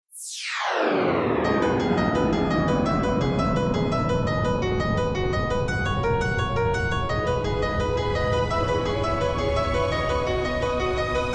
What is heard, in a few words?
instrumental